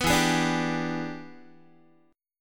E 7th Sharp 9th Guitar, chord; E7#9 strummed; JGuitar

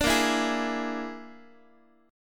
Bb 7th Flat 5th Bb down Guitar; Bb7b5 strummed; x,x,5,8,8,7

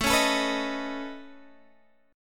Db 7th Suspended 2nd Sharp 5th A, chord shape

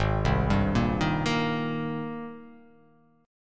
Ab Major 9th Ab down, chord; AbM9; arpeggiated

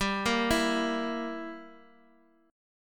G Minor 6th, Guitar Half Step Down

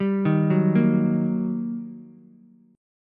G Minor Major 7th Sharp 5th, down, Ukulele; GmM7#5 arpeggiated; JGuitar

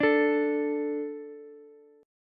D 5th D, down, Ukulele; D5 strummed; JGuitar

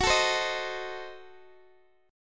Gb Diminished 7th, down, Guitar, Open D

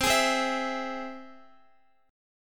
C Augmented down Guitar; strummed

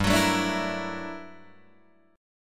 G Minor Major 13th, G, Guitar, DADGAD; GmM13; strummed

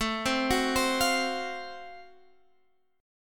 F sharp Minor Major 7th Flat 5th, guitar

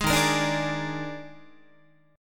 Eb Major 7th Suspended 4th Eb, Guitar; EbM7sus4; strummed

